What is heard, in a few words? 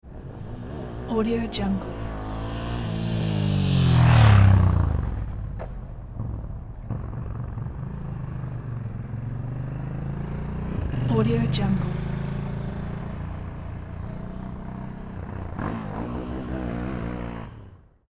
italian motorcycle passes by and uturns away; game; رد شدن و دور زدن موتور